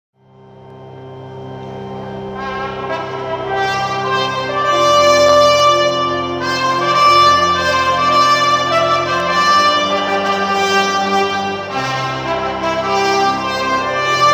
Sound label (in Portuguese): clarinada marcha nupcial; clarinada da rainha elizabeth